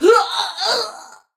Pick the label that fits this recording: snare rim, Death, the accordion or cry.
Death